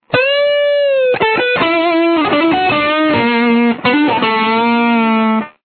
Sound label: lick, guitar